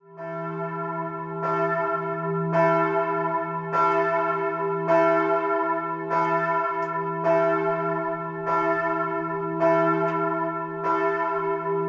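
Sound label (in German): Glocke nach Umstellung auf Fallklöppel und vorher mit Kontergewichtsklöppel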